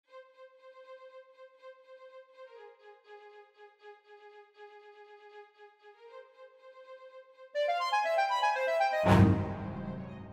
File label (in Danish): FUGATO SKITSE